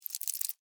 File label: Insect Move Fast, Movement, Bug; Animal